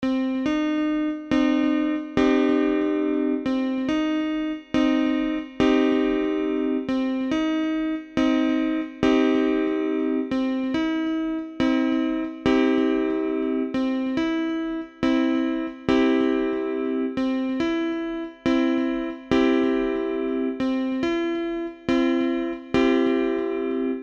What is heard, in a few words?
Thirds